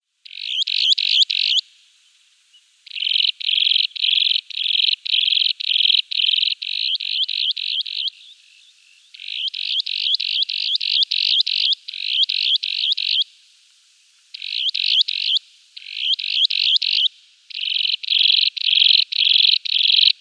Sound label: Broad-billed Sandpiper male display flight